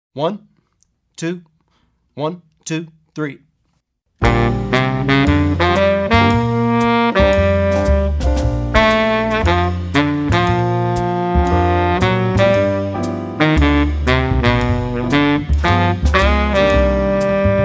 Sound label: Jazz Bari Sax with bari sax soloist; Effective Etudes For Jazz Bari Sax